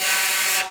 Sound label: noise alto; hellcafe